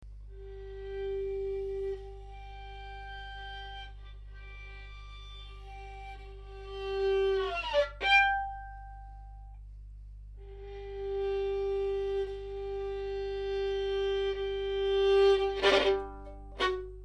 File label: violin